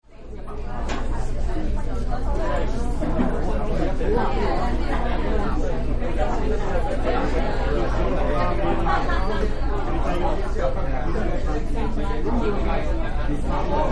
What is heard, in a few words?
Small chinese restaurant, Ambience Recording Inside A Small Chiense Family Restaurant; Restaurants